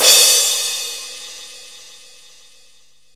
drum crash f sharp key

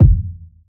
small reverb kick sound f sharp key

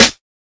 trebly snare drum a sharp key; snare drum samples

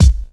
Sharp Steel Kick Drum Sample C Key

